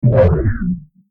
time stop; FXHome

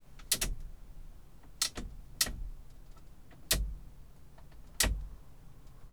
Lada Niva, Windhshield Wiper Lever, Interior; 1996; Pole Position

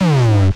Mid Tom; Percussion; 99Sounds; Dark Engine; Burnt Hits

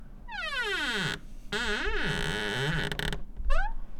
Low Squeak, Door; FXHome; Squeaking

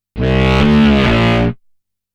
Aliens Monsters; FXHome

